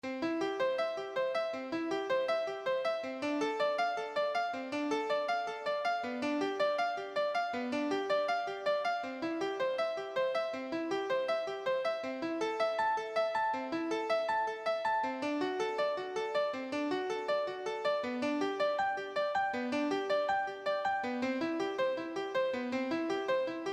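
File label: bach prelude 1 guitar keyboard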